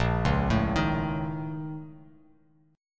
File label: A sharp Minor Major 11th, down, Bass Standard; arpeggiated